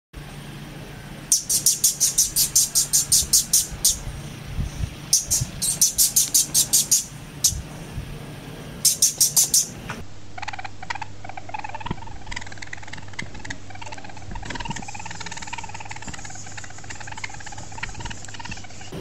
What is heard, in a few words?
Weasel noise